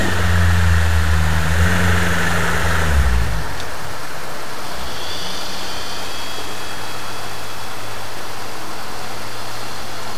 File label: Kite Outboard